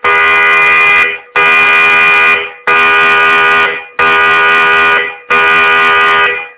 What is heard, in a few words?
HMS Illustrious Action Stations